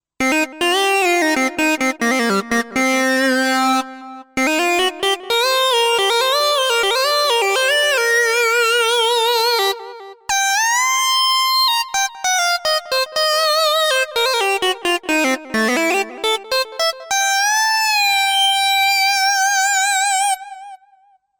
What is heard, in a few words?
Cypher2 LD Dual Panned Hard Sync